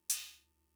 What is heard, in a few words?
Cymbal; Fricke MFB-522